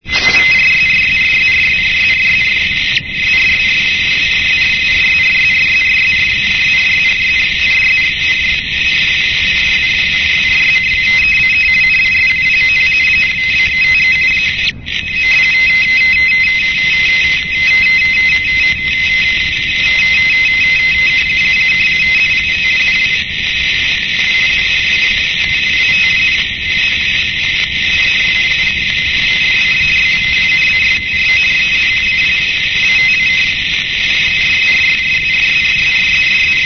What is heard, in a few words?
rtty 50 bd; europe; saam spitsbergen; 'uks'; rainer brannolte